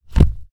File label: Body Impact